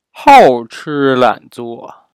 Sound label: game music; Tsinghua; bagger